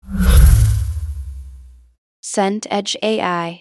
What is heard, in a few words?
whoosh low